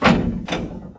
wood; Mafie 1

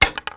wood; Mafie 1

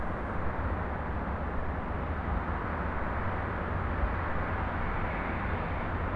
ambi town; Mafie 1

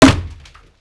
wood; Mafie 1

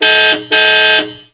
horn; Mafie 1

